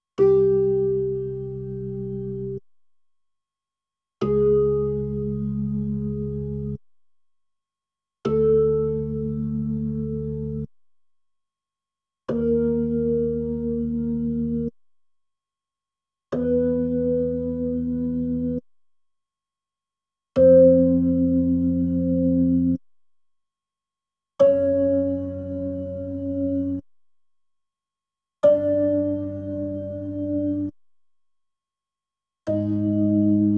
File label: B3 Organ; Masterbits CLIMAX 8